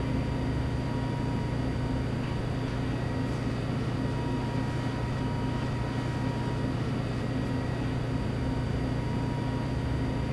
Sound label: ambience lab